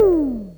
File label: floop, Toms Electro